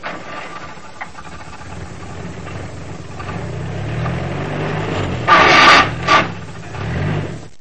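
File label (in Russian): звук работающей фуры на стоянке куда подъехала вторая фура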